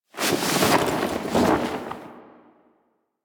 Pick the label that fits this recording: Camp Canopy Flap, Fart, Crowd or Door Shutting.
Camp Canopy Flap